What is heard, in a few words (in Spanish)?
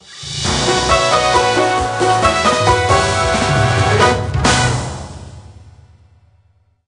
Tema de victoria; Wii Fit; SSB4